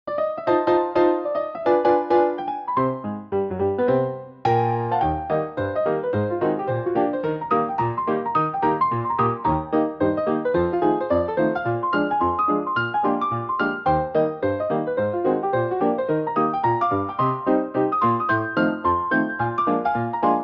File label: Fast tempo meme; too many tabs open